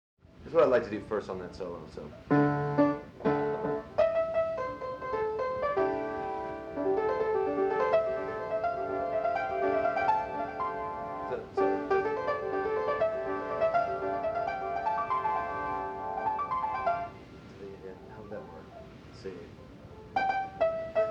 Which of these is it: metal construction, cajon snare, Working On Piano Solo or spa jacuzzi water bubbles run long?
Working On Piano Solo